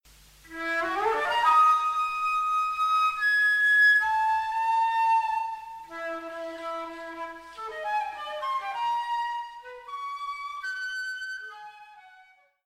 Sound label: flute; nueva cortina